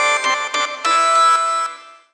SYN, Roots Funk; USB Soundscan vol.41; AKAI

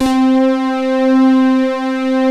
SYN E KEYS, pads; Pro-Rec - Synthsonic; TR PADS

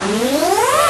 Misc Short FX

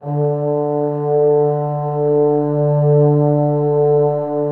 French Horns, D2

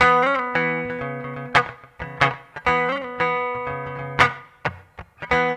GUITAR, Funky Ass Loops; East West

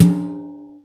Yamaha EX-5

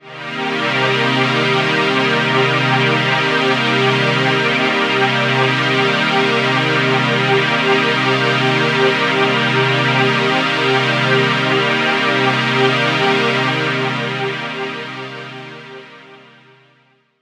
Pad C2, club techno; Zero-G

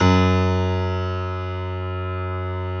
Piano F#1; Proteus 2000; Digital Sound Factory Proteus 2000

